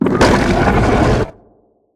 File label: damage; SPINELINGGIANT